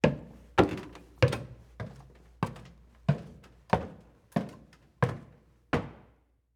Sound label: Stairs Up Wood; Footsteps; HauntedBloodlines; SFX Producer